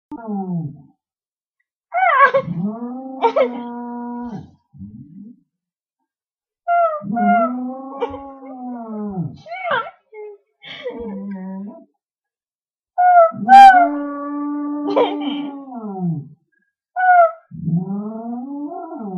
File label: howling; sasha